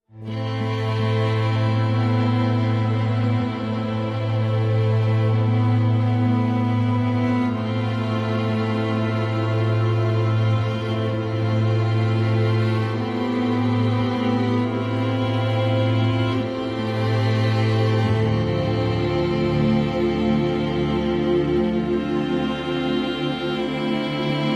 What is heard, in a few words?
Sad Silence, Strings and Pads